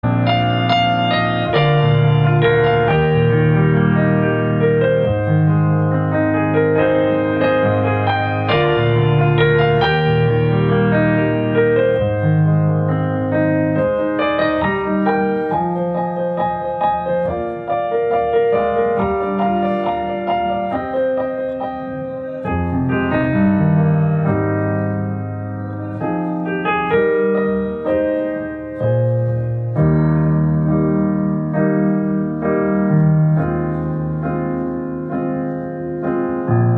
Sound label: piano; tonight live piano